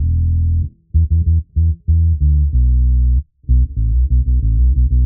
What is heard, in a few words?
P Bass E, 95bpm, dub; musicradar; dub designer